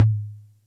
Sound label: Music Percussion Computer Tom, Drum Machines